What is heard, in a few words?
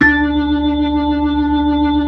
B-3 Blues